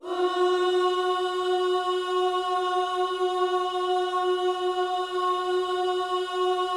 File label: OOS F#, Vocal Gospel